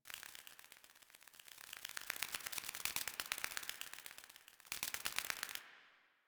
Fairy Butterfly Loop; DE; Warframe

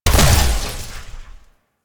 Mech Jump; Nechro

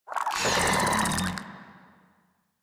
Desert Skate Vocal Attack G; Warframe

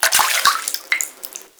Hut Interior Drinking Well Drips; Midrath Island

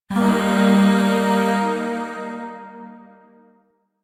Shawzin Blues Chord H; Chords; Warframe; Zariman; Void

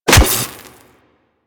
Melee Staff Impact Robotic Flesh

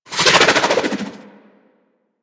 SMG Projectile By; Weapons; Warframe; Tenno; Sundial

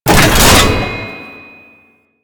Tank Cannon Charge Start; Enemies; Weapons; Warframe; Lasrian

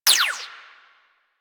Fire Shot; Burston Prime; Warframe; Weapons

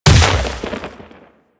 Ice Proj Hit; Warframe; Corpus; Arachnoid Camper Terra; Abilities

